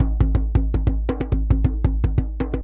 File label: DJEMBE GROOV; Best Service; World Colours